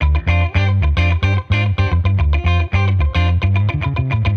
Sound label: P Bass Tele Mix 110, Guitar Bass Mix; 110bpm; funk soul